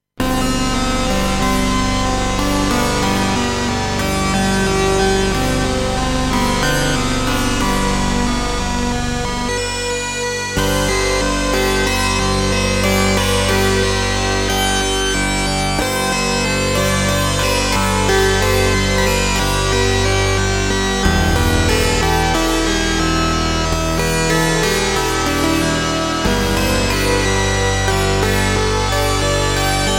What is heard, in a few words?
Motion Pad